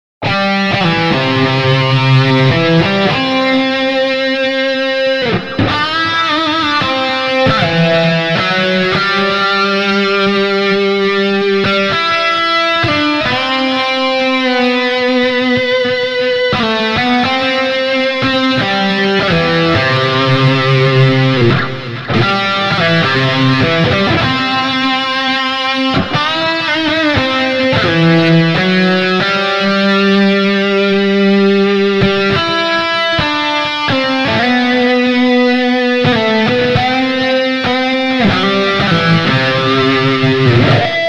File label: Heavy metal